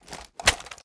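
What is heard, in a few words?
assault rifle inspect; weapons; gmod; viper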